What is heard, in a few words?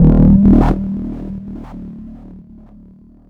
ROAR E2, SYNTH BASS